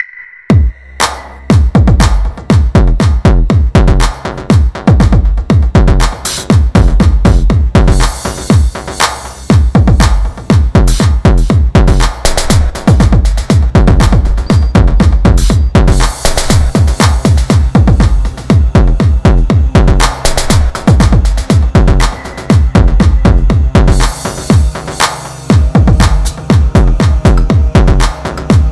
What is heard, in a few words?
Diabolical swamp